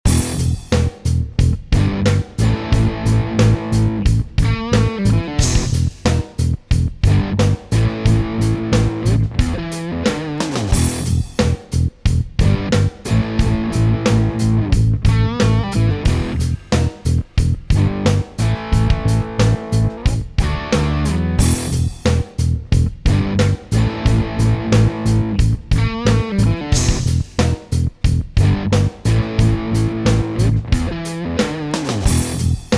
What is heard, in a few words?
90BPM ROCK LOOP